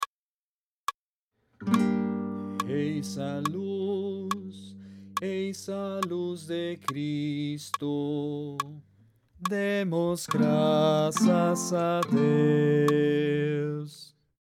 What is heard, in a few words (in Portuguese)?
Eis a luz de Cristo Baixo